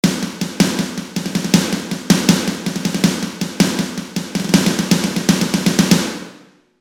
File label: Snare Clear; AttackDrums